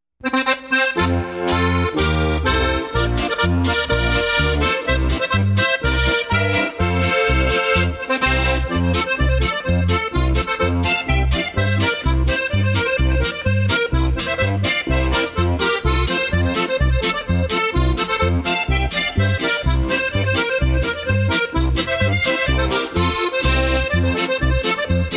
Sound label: Harmonika Polka, Button Box